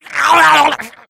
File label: death, infected; npc; die; szf